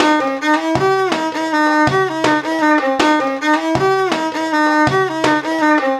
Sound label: 80 PERC VIO 2, Arabian Traditions; USB Soundscan vol.43; AKAI